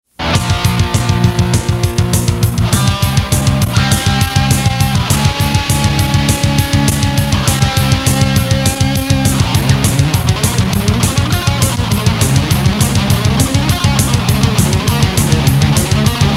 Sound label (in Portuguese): solo da musica